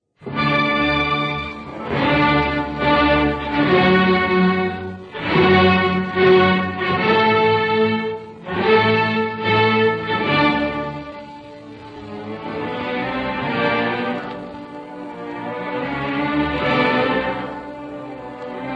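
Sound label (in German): Ouvertüre für Klavier; Mozart; Busoni; Idomeneo; von Ferruccio Busoni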